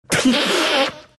vipe laugh; dota; viper

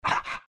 pant big, greevil; npc; courier

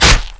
attack; dota; creep

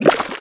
fish flap; ambient; dota

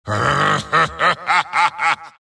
wraith laugh; dota; skeleton king

